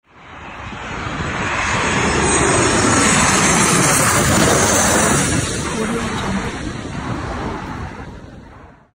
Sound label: jet plane fly by; pure-noise